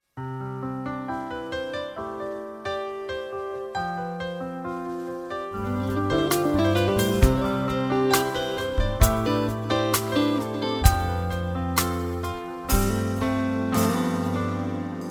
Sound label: Loop; Augustinus